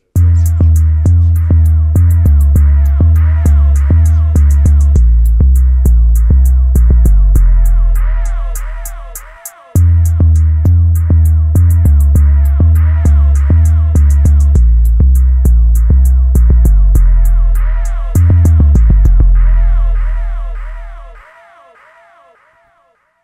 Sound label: woofer